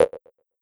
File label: button beep